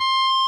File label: solo